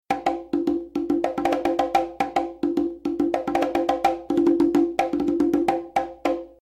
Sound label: conga